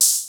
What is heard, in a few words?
Hi Hat, Cwejman S1 MK2, Drum Machines; 300 Drum Machines